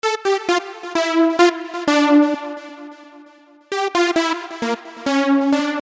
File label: LEAD